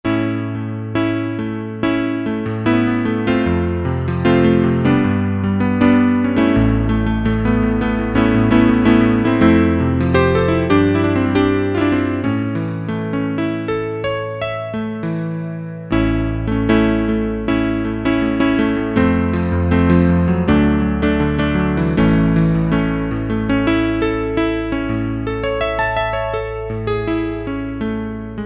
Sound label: 16' CP-70, Electric Piano, Korg DSS-1